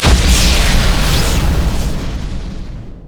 chaos storm; LoL; viktor

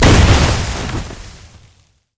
snowday qspellexplosion; LoL; ziggs snowday

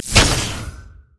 shock blast; LoL; jayce

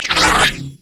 essence flux; LoL; cyberezreal

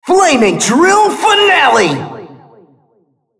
ultimate effort; RumbleSkin03

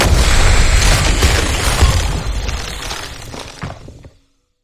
frozen tomb exp; LoL; lissandra base

